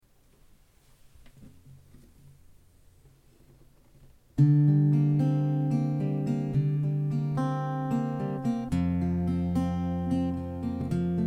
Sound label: F110 Alt Tune dry